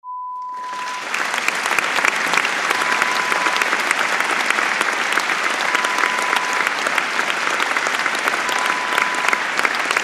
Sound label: Applause Large Hall sound COLLECTION